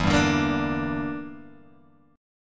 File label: E Minor 7th Flat 5th E, Guitar Open G